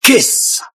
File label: kiss